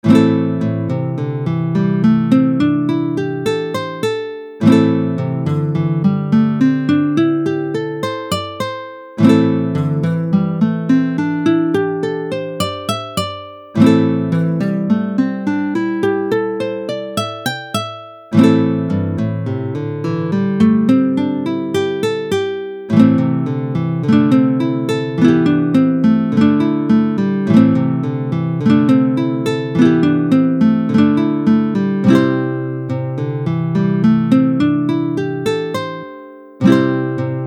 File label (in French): Penta mineure majeure